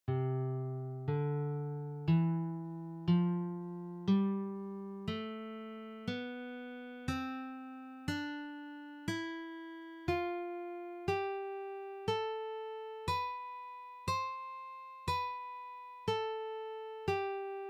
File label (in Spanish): escala de do guit